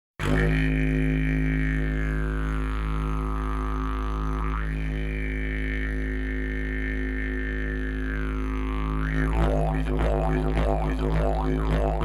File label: didgeridoo